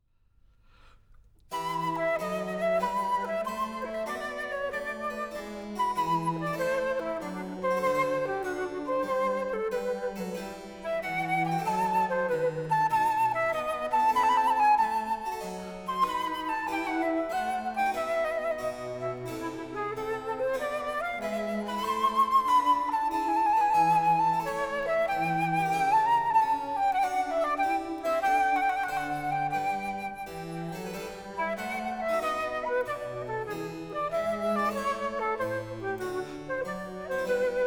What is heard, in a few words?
flute sonata in e minor larghetto adagio